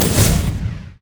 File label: light strike; LoL; lux